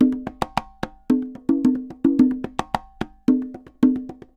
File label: Congas Murga 110 bpm, Drums & Percussion; Best Service; Festa Latina VOL-1